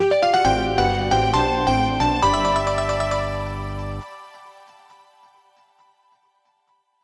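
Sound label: fanfare intro; Cave Story 3D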